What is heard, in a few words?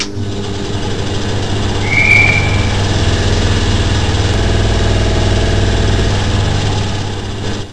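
Door Close, Train; M41; OpenBVE; Szemely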